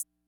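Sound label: Ace Tone Rhythm Fever closed hi-hat, Drum Machines; 300 Drum Machines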